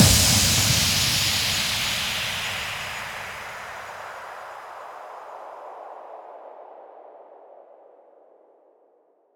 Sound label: EDM